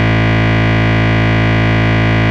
Bass Root A

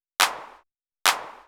Clap, 128 BPM; Vocal-Hook Kits; Rap

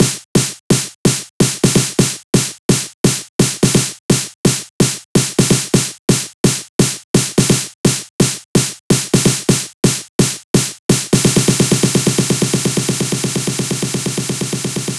128BPM Style, House Electro Fills; 8Bars; Vengeance Samples

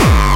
BD Distortion, Bassdrums; Vengeance Samples

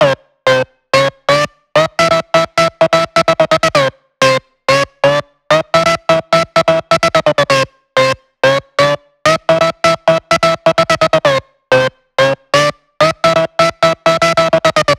128BPM Melody; Vengeance Samples; Fatman Kit

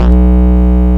Root B, Ultimate Bass; Vengeance Samples

